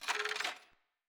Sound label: scatter Counter Up; netent